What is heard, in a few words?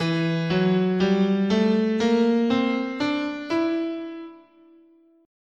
E Locrian sharp 2, scale